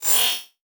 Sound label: Multimedia Internet CD-Rom Flash Click Multiple